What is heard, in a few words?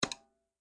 Sound GUI Mouse Over Tower Icon